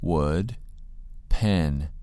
wood pen

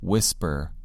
whisper

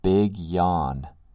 big yawn

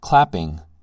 clapping

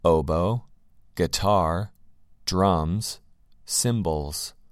oboe guitar drums cymbals

